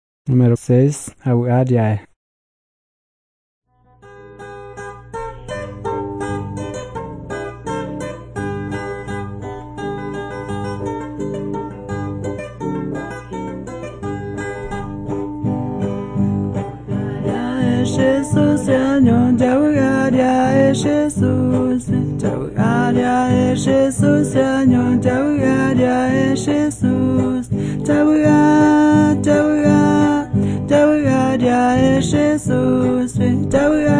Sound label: songs guarani mbya; bible